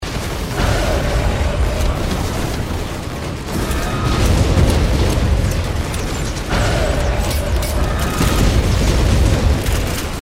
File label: fight no music; risk of rain 2